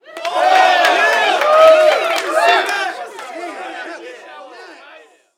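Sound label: Street Ball Crowd Cheer High; Crowds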